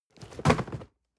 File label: cardboard box medium fall high; objekte